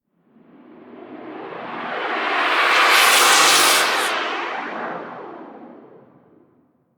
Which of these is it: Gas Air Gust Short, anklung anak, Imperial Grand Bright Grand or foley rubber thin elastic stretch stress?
Gas Air Gust Short